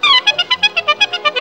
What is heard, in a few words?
CLARI GROOVE; Best Service; World Colours